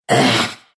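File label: pain, Russian; Dota 2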